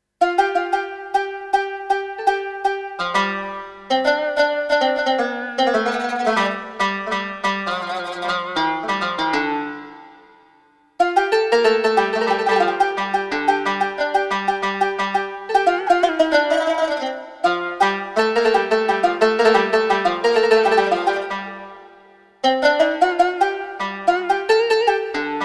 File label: Solo Kanon